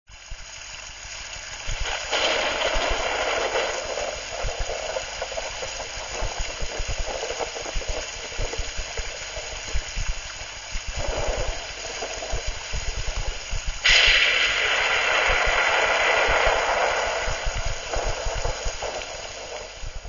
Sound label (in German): gewitter